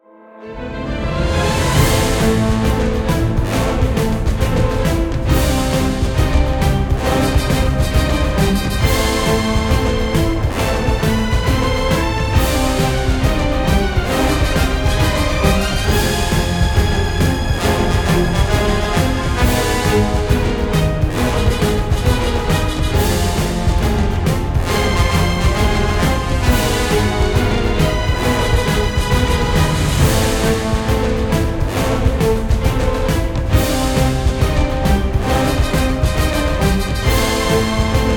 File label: Cartwheel 136BPM; ps4; xbox series xs; SM2 Montagne 05